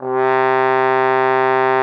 TROMBONE C3; Xsample VOL-3

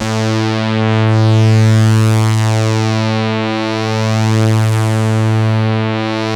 PULZE SWEEP, BASSES; Masterbits; Vintage Voltage

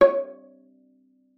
C#5, VIOLA PIZZ; Xsample VOL-5; 90 s Sample CDs

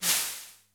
BRUSH, D110 DRUMS; Crypton - DrumBase5000

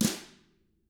FLAM, Drums; ILIO; Double Platinum Drums 1; DW

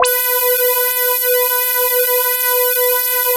CHUNKY B5, Drums; Drums In Your FACE!

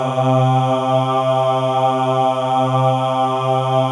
MALE AHS, Symphony of Voices; Spectrasonics

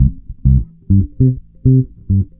Organic Bass; Trip-Hop; Loops; Sonic Foundry (Sony Creative Software); Rondo Brothers Trip-Hop Loops & Samples; El Corazon

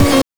BANK2 SFX, NES DPCM; MIDINES

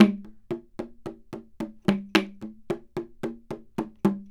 logdrum, percussion; ILIO; Synclavier Percussion 2; World & Orchestral

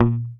HOFNER MUTE, Bass

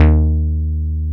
GRUFF D#2, BASSES; Best Service Alpha Dance vol.2

